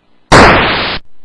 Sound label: launch bomb; missile command